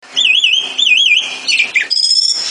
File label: Nokia Cooool Msg